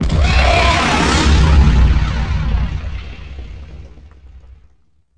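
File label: combat explode tie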